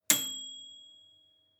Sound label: Microwave Oven Bell